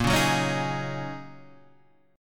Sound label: G sharp 7th Suspended 2nd Suspended 4th